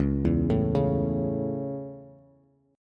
Db Minor Sharp 5th, Bass; arpeggiated; 9,7,7,6

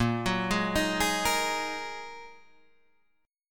A sharp 7th Suspended 4th Sharp 5th down; arpeggiated; JGuitar

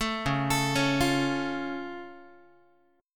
Db Augmented, Guitar; arpeggiated

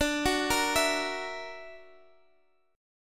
Gb Augmented 7th D

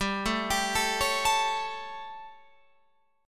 G Suspended 2nd Flat 5th G Guitar Open F; Gsus2b5; arpeggiated; 19,16,19,16,16,16

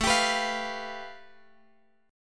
A 7th Suspended 2nd Sharp 5th, Guitar, DADGAD; 19,22,21,22,x,x

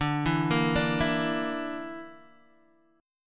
D Suspended 2nd Sharp 5th D, Guitar, Open D

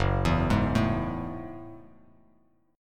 Gb Minor 9th, Bass Standard; arpeggiated; JGuitar

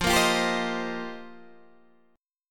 F 7th Suspended 2nd Suspended 4th F Guitar Open D

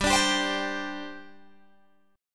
G Suspended 4th, Guitar, Open G

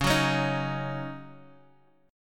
D sharp Minor 7th

